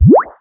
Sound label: alien go